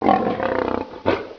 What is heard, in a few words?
lion bark